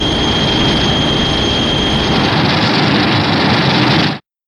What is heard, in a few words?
Heat Ray to Thermal Flame; Shin Godzilla